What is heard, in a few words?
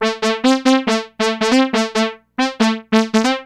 brass